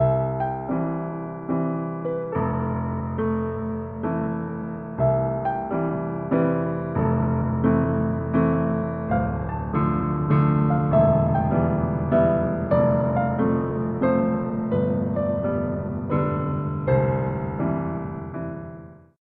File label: piano